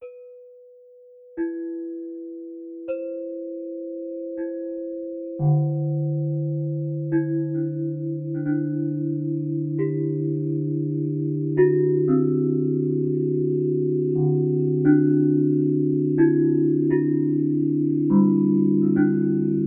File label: chimes just ratios